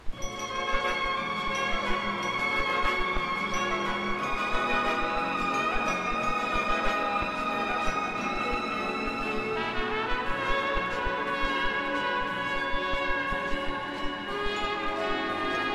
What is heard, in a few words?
Mall Music Muzak